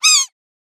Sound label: otter cry